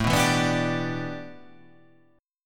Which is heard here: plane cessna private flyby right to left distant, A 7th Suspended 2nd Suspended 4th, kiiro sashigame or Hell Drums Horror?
A 7th Suspended 2nd Suspended 4th